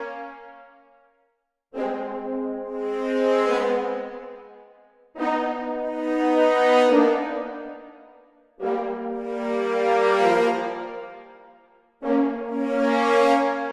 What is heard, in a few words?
Horn Swell; напряжение